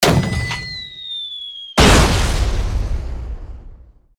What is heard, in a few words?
Mortar strike launch and impact